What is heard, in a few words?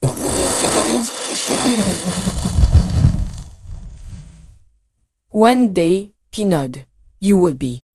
jingle